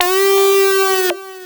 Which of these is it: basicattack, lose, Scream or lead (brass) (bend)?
lead (brass) (bend)